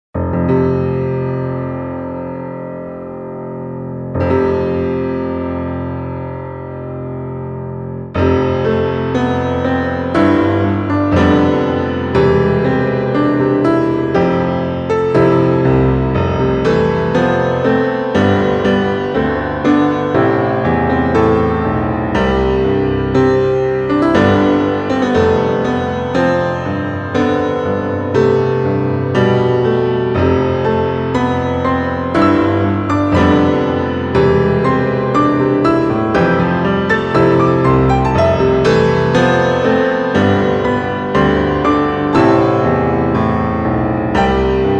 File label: Majestic Piano; cyber city 2157